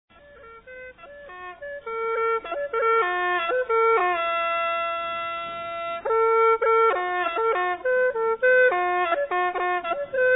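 Instrumental Music, Hmong Lay Folksong Pii Hmong (Flute); Tueoti; Xamtai/1999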